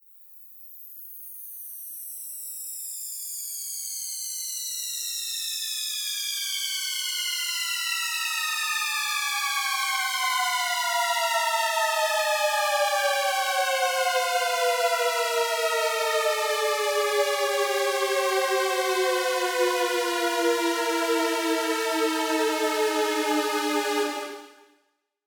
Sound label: Long Falling Effect